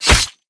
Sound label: knife hit; Valorant